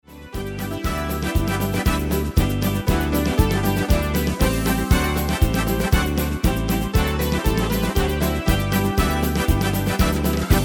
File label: Party Samba Medley